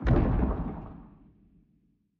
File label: quick Bounce; mercy of the gods